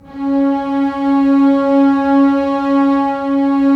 STR SEC, Roland LA Composer, Sec Mute St

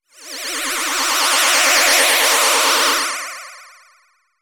FM FX, synth; AKAI S-Series CD-ROM Sound Library VOL-6

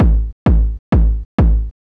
130 kick loop, 4 on the floor loop; Kicks

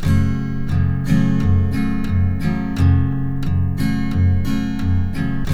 TK MIN G, Guitars; Spectrasonics; Hans Zimmer Guitars VOL-1

